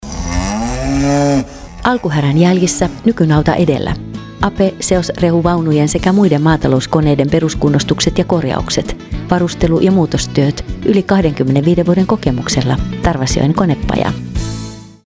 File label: Banjo C3 A4